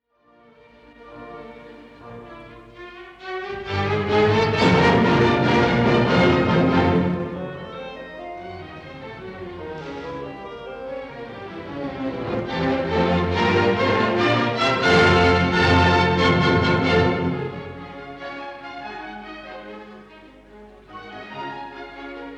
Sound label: Symphony No. 4 III. Allegro vivace; Beethoven